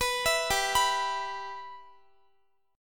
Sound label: G 6th, Guitar; G6 arpeggiated; x,x,21,21,19,18